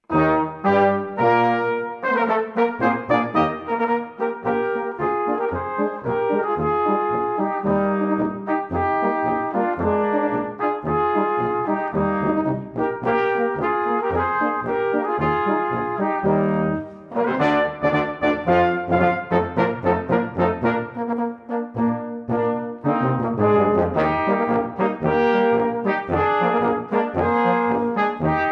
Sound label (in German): Steinriegler Marsch